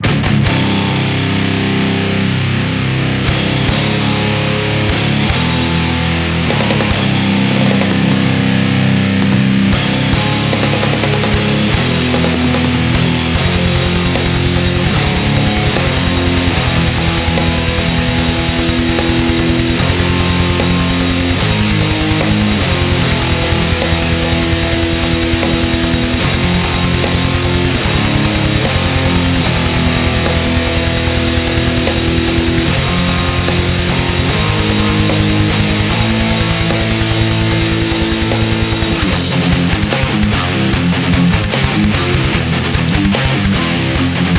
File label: metal